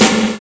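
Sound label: deep reverb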